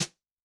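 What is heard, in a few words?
Brush Slap L, Yamaha DTXpress-II snare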